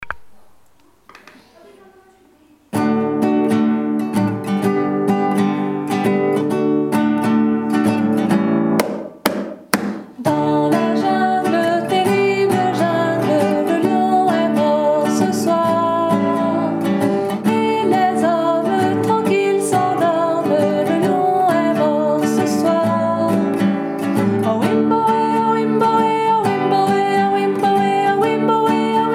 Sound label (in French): chantée